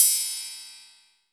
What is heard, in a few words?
CLOCK; NorthStar; Global Instruments; MTree+SlBell